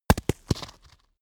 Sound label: stone hit ground; natur-tiere; steine